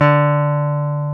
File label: Jazz Guitar; Club-50 - Foundations; Jaz-Kessel